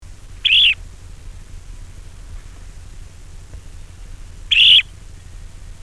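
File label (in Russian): chernozobik golos, dunlin voice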